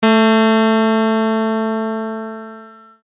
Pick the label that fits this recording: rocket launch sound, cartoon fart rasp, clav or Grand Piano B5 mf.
clav